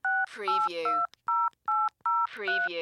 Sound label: Telephone Cordless; Sounds of ringing telephones, cell phones, mobiles ringing.